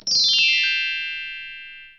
Bells; ICQ 2.0b; ICQ Sounds

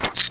Switch; SecretsJ DEMO; WATER

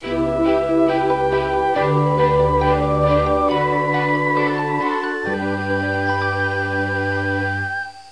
dramatic finale; Pronto

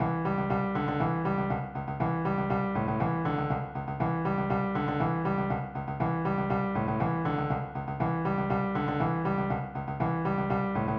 Reggae

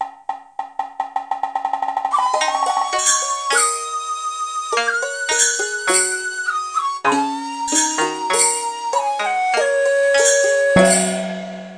Chinese Music; Play&LearnRC

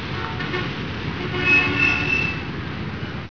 CITY; Simon and Schuster Interactive

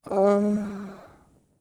groan; Singles Flirt Up Your Life; Pacifica

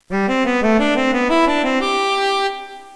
bassoon; Talking E-Mail

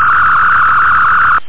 Phaser; Amiga 8-bit Sampled Voice; Treck

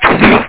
SLICE; dosgames; labyrint

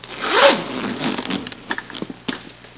zipper; Sound Effects for Multimedia - Volume 1

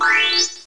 Jingle

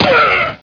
BLOCK; SOMC Family Forum

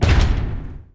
collide; Starsiege

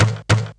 knock; impacts

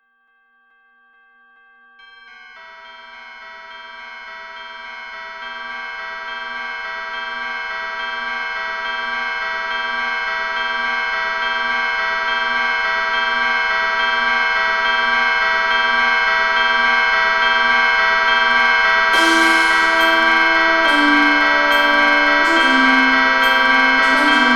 BELLS

